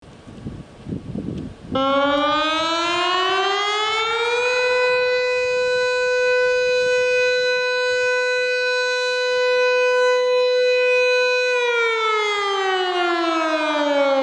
ulvo air raid, sirenes; teho ulvo d1200

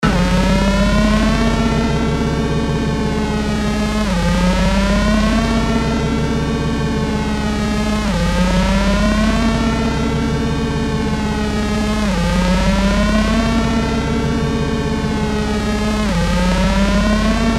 nervous siren

